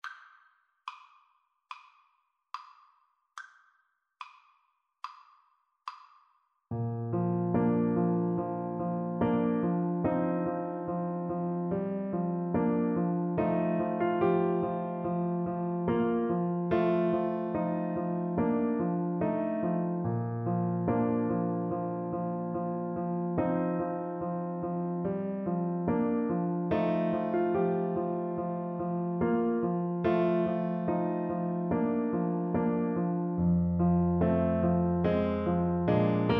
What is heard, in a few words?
Tuba